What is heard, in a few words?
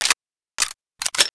reload pistol; Opposing Forces; FSoldier